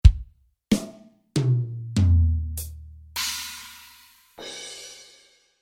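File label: Drum ambient; Drumset; gedämpften Proberaum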